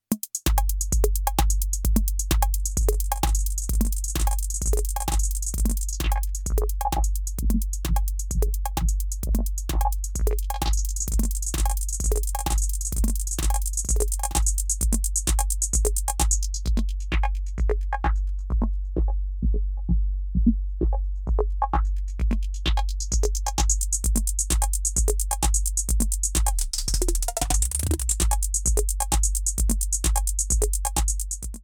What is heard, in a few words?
Erica Synths Black Hole DSP2 LP Filter Latency